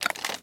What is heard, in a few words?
gun foley blaster draw; reload